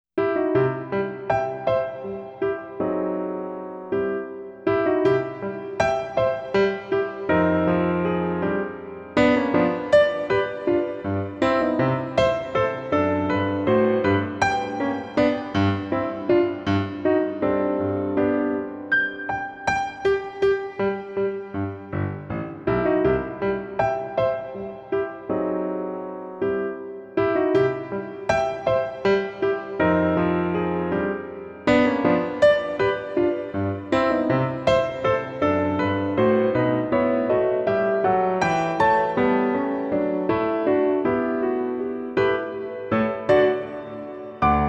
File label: Airy Feathers for Piano; Radiata Stories Arrange Album